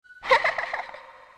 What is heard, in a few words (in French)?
rire